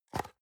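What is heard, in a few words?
box small close medium, Büroarbeit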